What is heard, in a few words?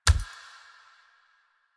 Steps; Warframe; Kalymos